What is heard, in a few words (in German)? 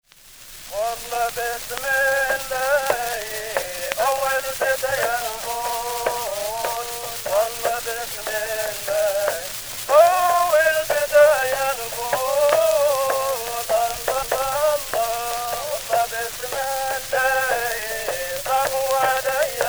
Tunesisch, Tunesisches Volkslied; Jalilani